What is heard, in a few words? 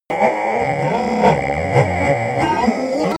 vocal glitch